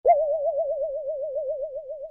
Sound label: boing; disney; mashup